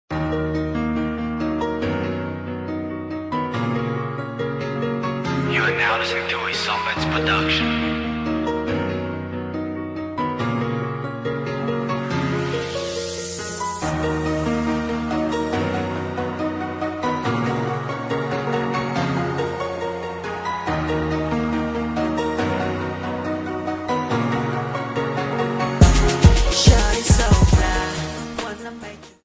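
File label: R&B instrumental beat w hook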